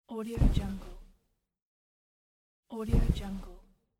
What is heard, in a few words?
sit down on couch